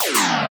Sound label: Sci-Fi, Classic SFX, cartoon